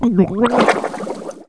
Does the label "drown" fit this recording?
Yes